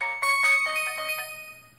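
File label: Sony Ericsson Battery Low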